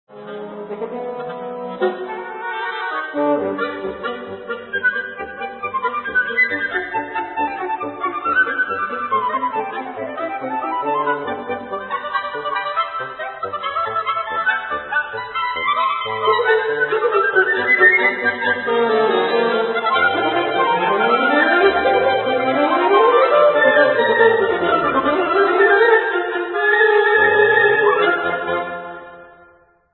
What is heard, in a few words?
Hornpipe